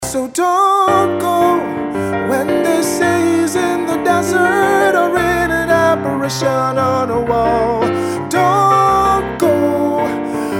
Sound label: ring tone